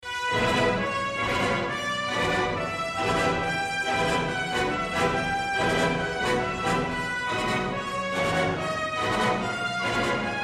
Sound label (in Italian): trombe; tromba; strumenti